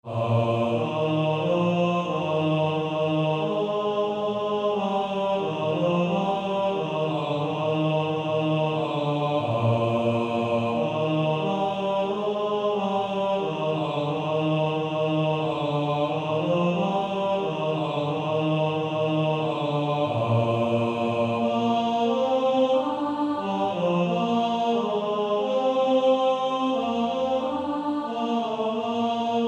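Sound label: Male Choir tenor 2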